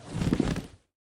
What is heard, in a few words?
Cruiser Sit Down; Lethal Company